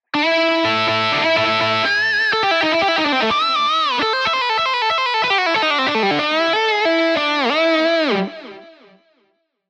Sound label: guitar